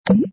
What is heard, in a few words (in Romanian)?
cadou; santas gift jump